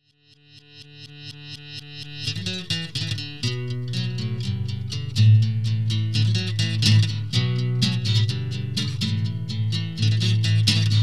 kogda plachet noch acoustic

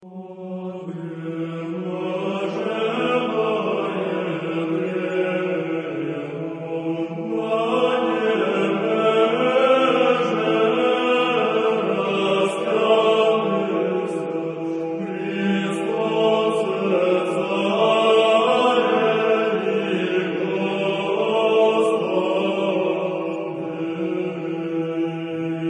irmos kanona glas viii s isonom; o treblazhennoe drewo; raspewy

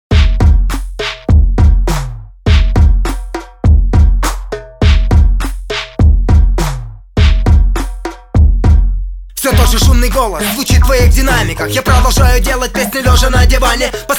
shumnyj

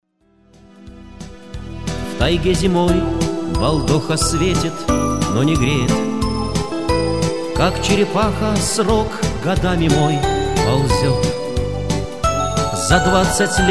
gitara s tresnuwsheju dekoj

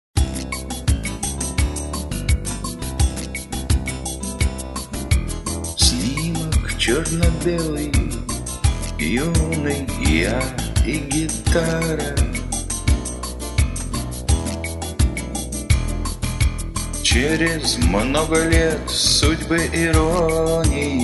gitara

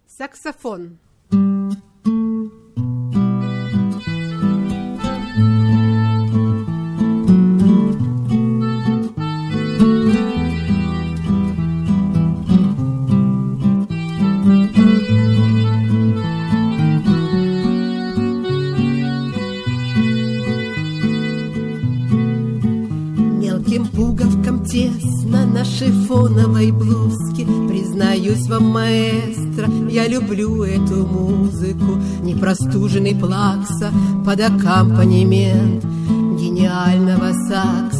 saksofon

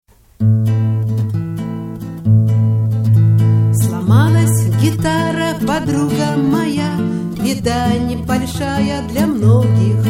slomalas gitara

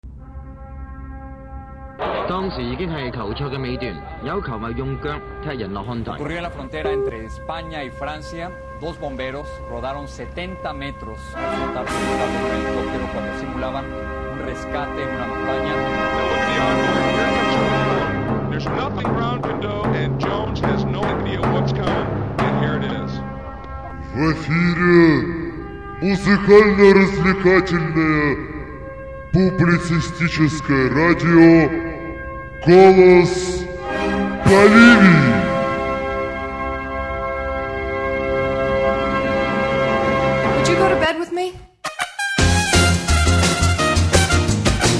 zastawka radio; golos boliwii

